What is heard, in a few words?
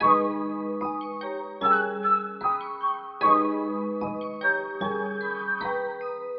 Melody Loop 150 BPM G# Min; Melodics; Melody Loops; Cymatics; Cobra Hip Hop Sample Pack